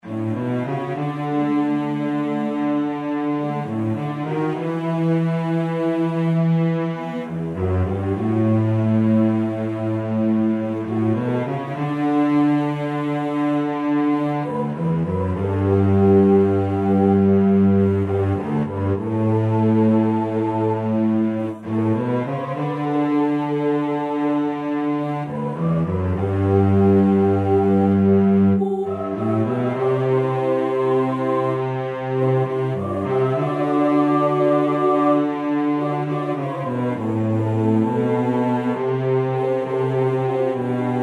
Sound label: Cello